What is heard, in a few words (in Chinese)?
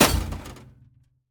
宝库破坏声